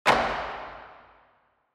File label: Taikos